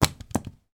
household video game controller drop on carpet floor; nintendo wii; sound effects; sound effects one